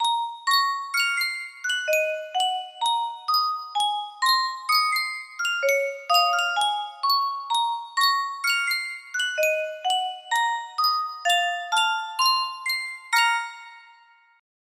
yunsheng music box, music box melody

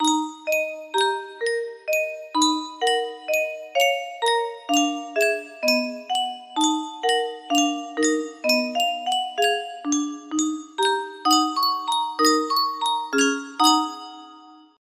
music box melody

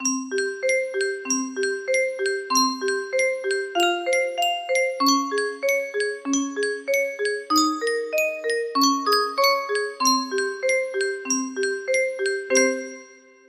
music box, music box melody

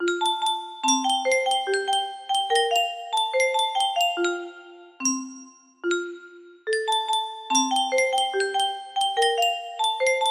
Yunsheng Music Box, music box melody

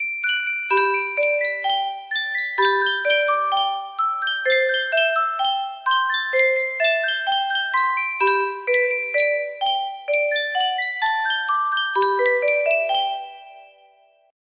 Yunsheng custom tune music box

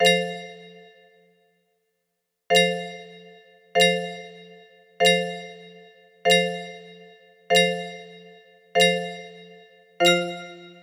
electric organ, music box melody

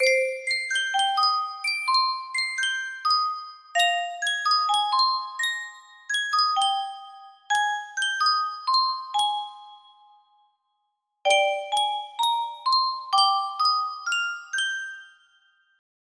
yunsheng custom tune music box, music box melody

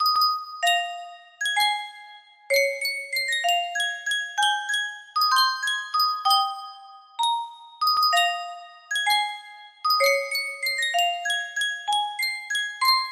Sankyo custom tune music box

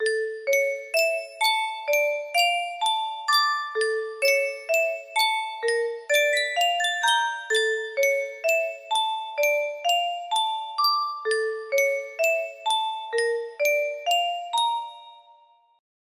Yunsheng music box

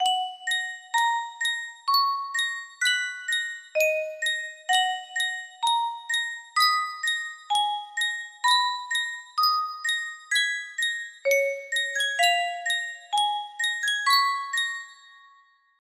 Yunsheng music box

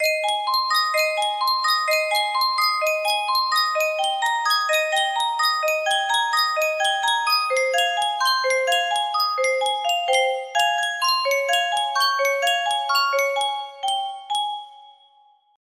music box, music box melody

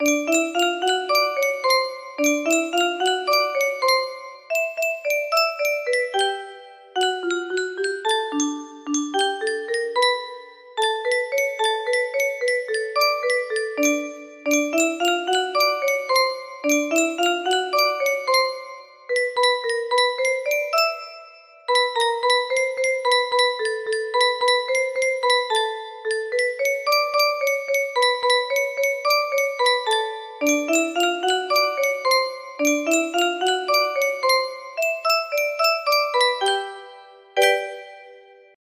30 note scale, music box melody

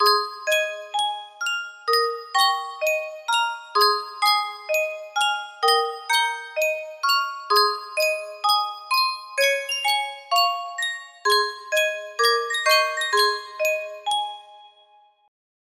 Sankyo music box, music box melody

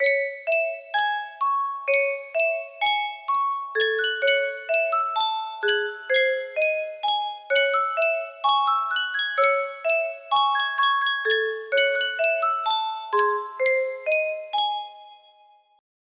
Yunsheng music box, music box melody

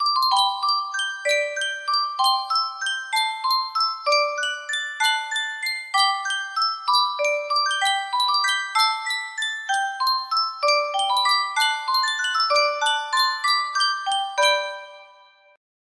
Sankyo music box

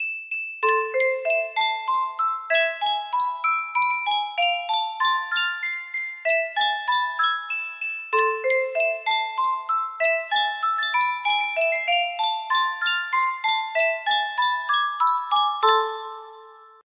Yunsheng music box, music box melody

